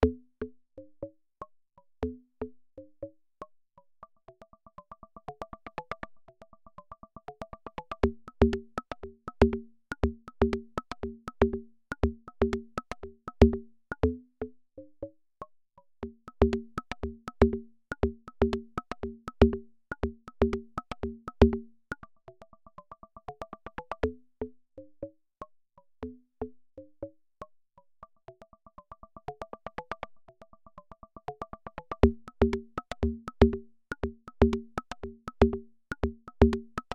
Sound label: hand drums Oboe